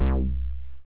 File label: flt sweep, analog